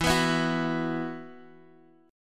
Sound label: B Suspended 4th, E, chord